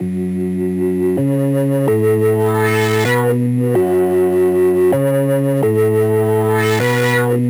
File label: Special Sounds Root F# 128 BPM; Vengeance Samples; VEH3 Special Sounds